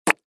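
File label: human spitting sounds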